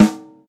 round acoustic snare sample g sharp key, Royality free snare sound tuned to the G# note; snare drum